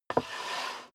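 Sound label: step dress boots wood creak slide long, footsteps; schritte; menschen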